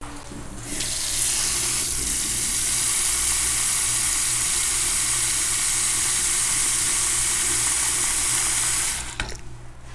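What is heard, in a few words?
tap turned on in the bathroom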